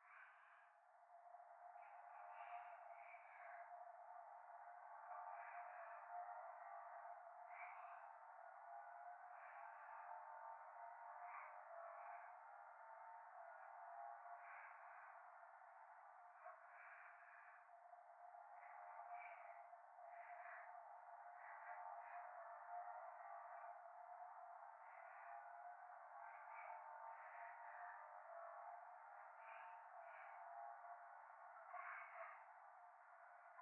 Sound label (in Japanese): 左耳 密着 処女 喘ぎ 車の中; 夢の中